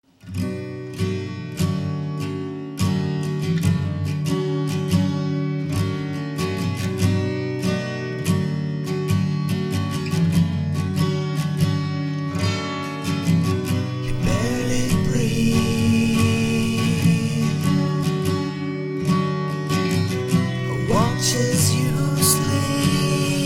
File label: Soft Rock